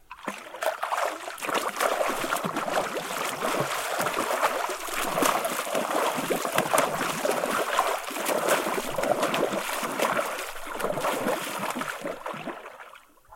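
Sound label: sounds of human footsteps in water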